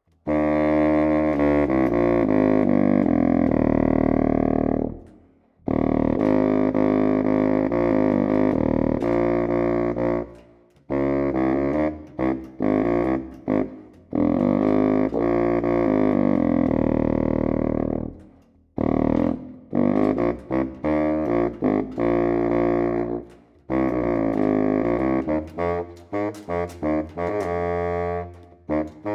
CONTRABBASSO; timbri

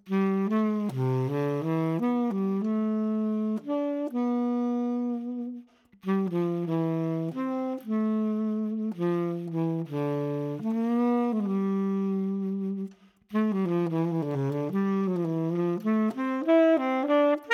TENORE; timbri

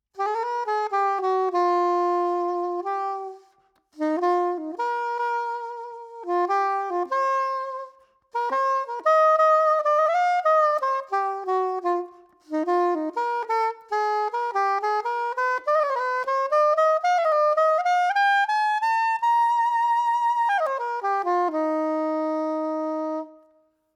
SOPRANO SAXELLO; timbri

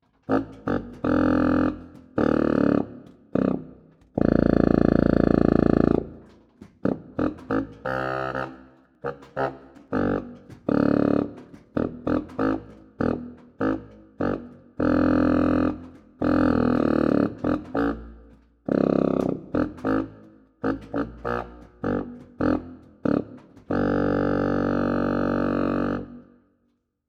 SUB CONTRABBASSO; timbri

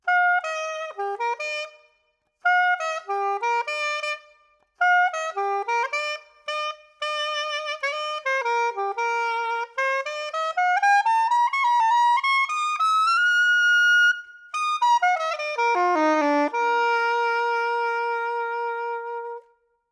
SOPRANINO CURVO, sax; timbri

